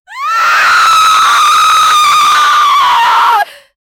female scream of agony, disturbing

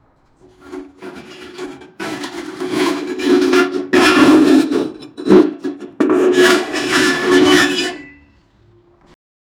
a large metal object scra; scraping

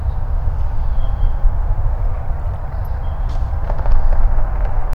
faint pecking sounds of, gravel

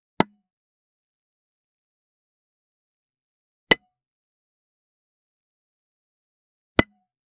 pickaxe hitting rock, digging

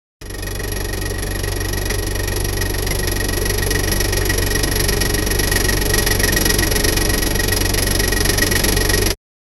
mercedes amg cle 53 sound, exhaust

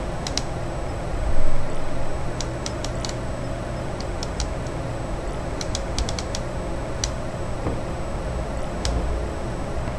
mouse button clicking, tap

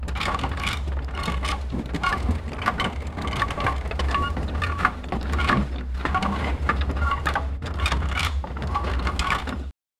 spinning wheels of wooden, transportation

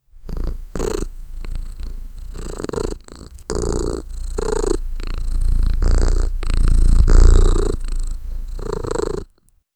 cute playful, kitten

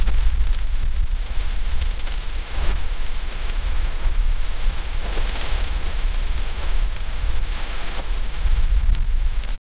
cinematic snow fall

